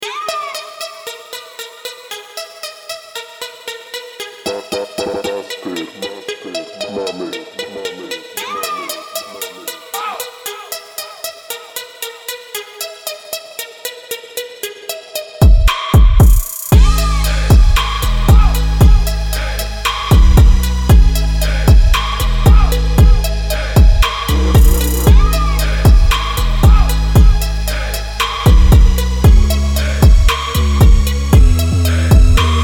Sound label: LIVE OX 110BPM